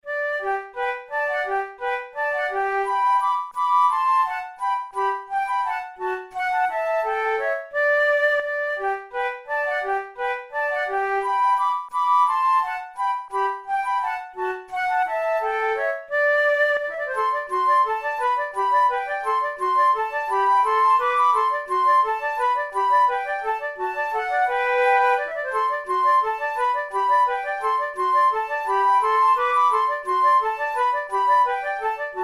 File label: waltz duet